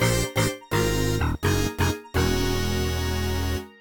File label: minigame game over